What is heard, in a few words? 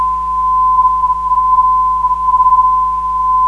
329kHz, NDB